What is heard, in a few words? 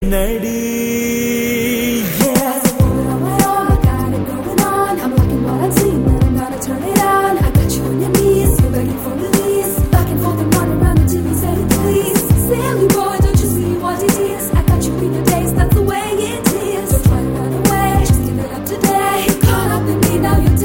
melody tamil